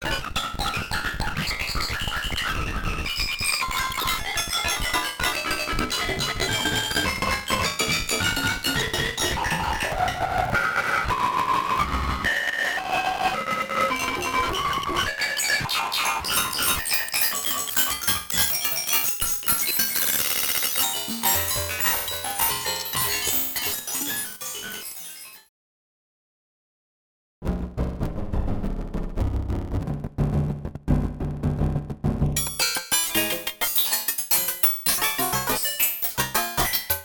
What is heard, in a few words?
Other sonorities with Lsystem sound synthesis